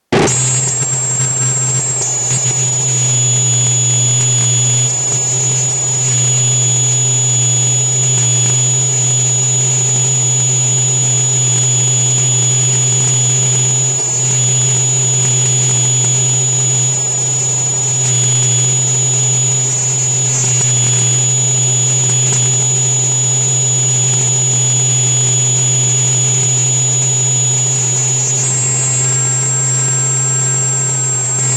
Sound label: glitched tones Harsh Noisescape